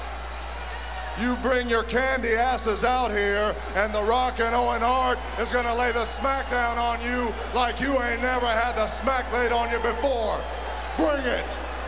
rock

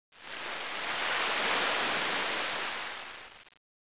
ocean small

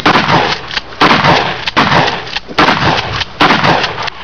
shotgun

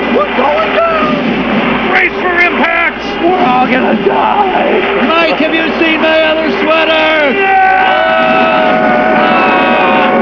crash; The SOL is crashing!!!; Diabolik

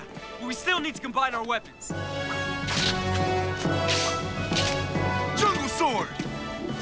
jungle

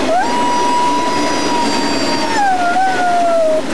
howl

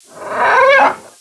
alien; A NEW HOPE

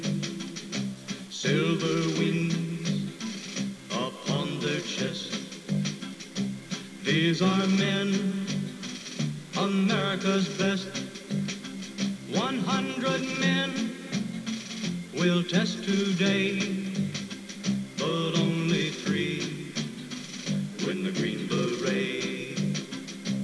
ballad

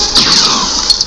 blaster

